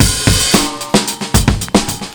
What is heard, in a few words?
Mondo Bongo; Zero-G; Beneath The Planet of The Breaks